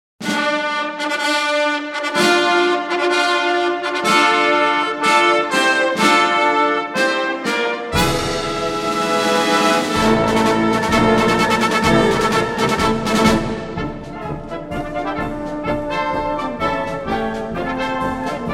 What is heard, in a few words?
Ukrainsky Marsch; russia